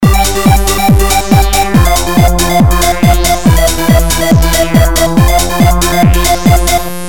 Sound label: Trance